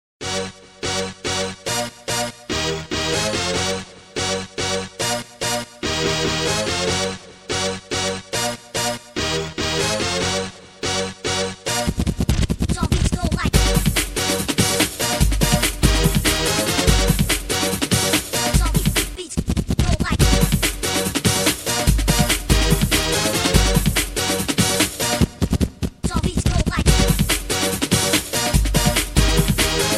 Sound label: Drumbeats